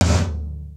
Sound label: GTOM, drum; Crypton - DrumBase5000; GATE KITS